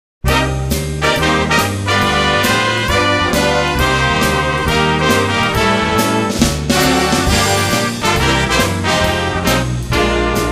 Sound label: jazz